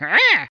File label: Grunty